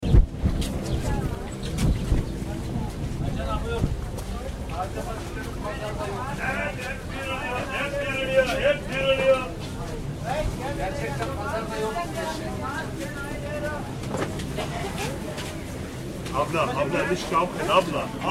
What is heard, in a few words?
marketplace; germany berlin